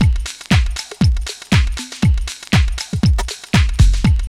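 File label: drum loop; X-Static Goldmine 3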